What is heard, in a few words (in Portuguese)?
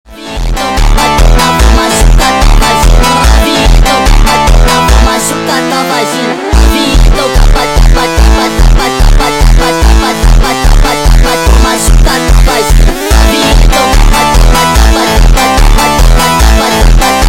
automotivo força sped up